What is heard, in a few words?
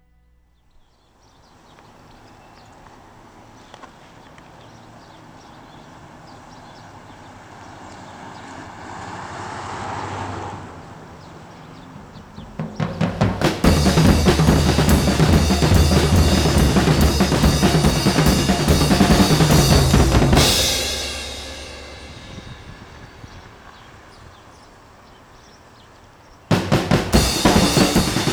Drums and Birds